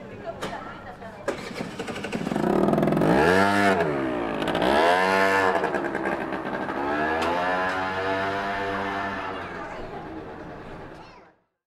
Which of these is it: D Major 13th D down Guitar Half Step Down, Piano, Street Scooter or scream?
Street Scooter